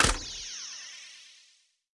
Navbar Trials; subway surfers city sound effects; Android, iOS

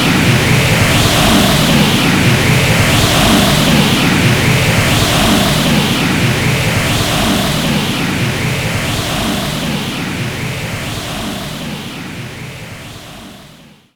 Enemy Temple Active Loop

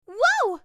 Falling

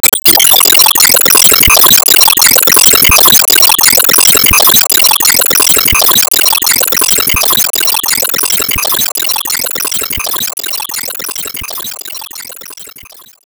Boomerang Loop; disney star smash android ios mobile gamerip 2020

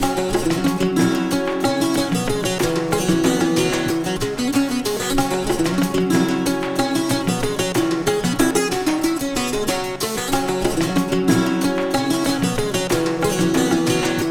Combat

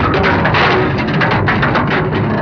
Drive Taxi

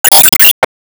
Enemy Masquerade Active Shot

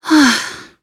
Emo Sigh

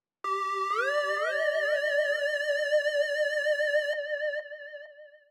Diegetic Music City UFO Loop; lego horizon adventures

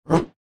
Leap; Joblin

